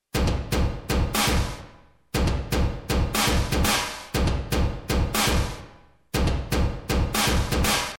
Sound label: Drum Plate Reverb